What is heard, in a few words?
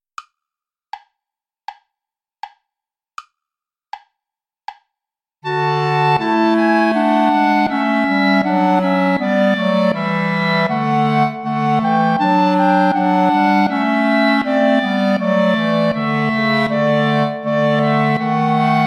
play along without clarinet